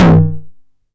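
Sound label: 909 mid tom tape saturation; Goldbaby Tape808 & Tape909; The Tape 909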